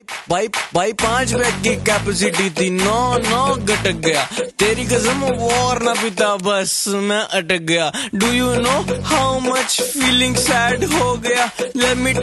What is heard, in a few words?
Party